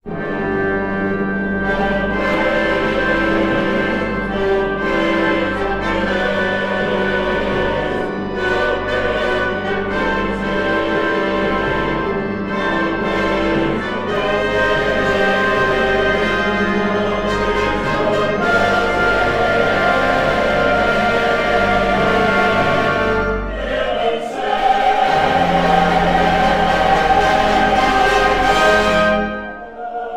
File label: fanfare; lachamberchorale